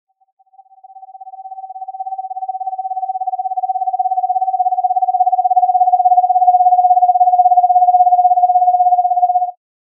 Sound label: vermiculated, owl